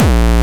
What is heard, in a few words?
bombo, kicks, Hardcore